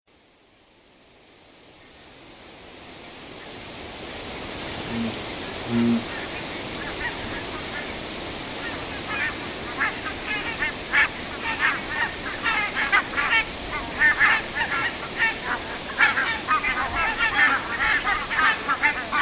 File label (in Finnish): valkoposkihanhet; valkoposkihanhi; lento ja kutsu; linnut